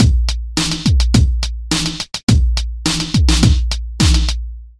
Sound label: Urban Beat Ringtone